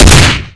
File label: aug fire; firearms